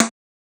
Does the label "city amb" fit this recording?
No